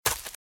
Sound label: Footsteps Leaves Sprint Left; RadwinIsland